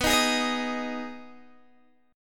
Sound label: B 6th B down Guitar Open G